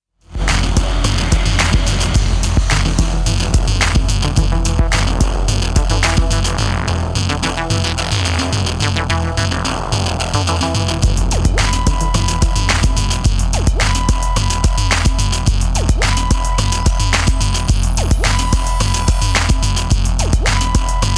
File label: instrumental